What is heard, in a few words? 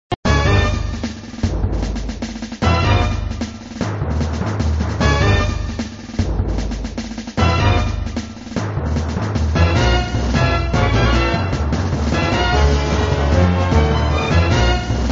Music, flipper; sttng